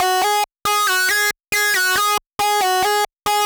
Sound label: Synthline DRY 138BPM, G#minor; Bassline & Melody; Vengeance Samples; VTS1 Zodiac Kit